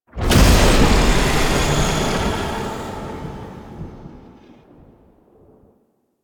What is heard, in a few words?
Rune Despawn; Warframe; Entrati; Mirror Defense